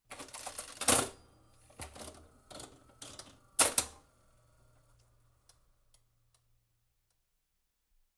spielautomat ziehen; Gluecksspiel

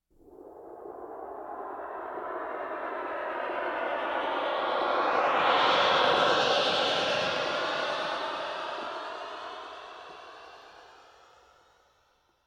ufos vorüberfliegendes grosses raumschiff; Science Fiction

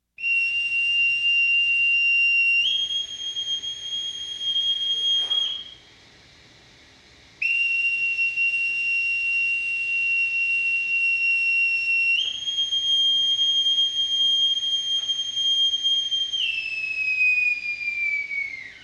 bootsmannspfeife ruhe!; Hafen

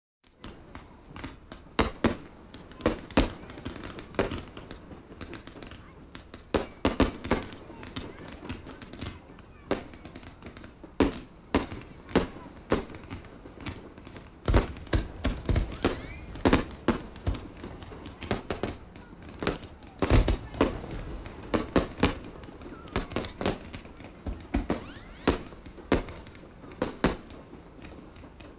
feuerwerk; Geraeusche; Feste Feiern

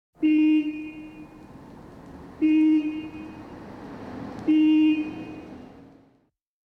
bushupe (elektrisch); Großstadtgeraeusche

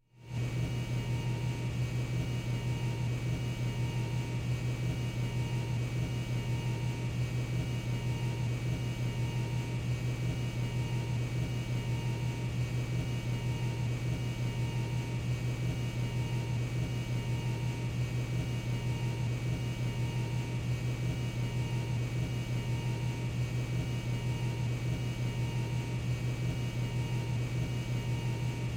kabinengeräusche während des fluges; Am Flughafen

